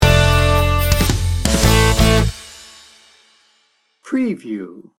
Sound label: river ride sting bumper